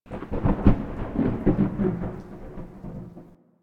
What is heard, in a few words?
Landscape Thunder Crack, Ambience; Warframe; Venus Open World; One Offs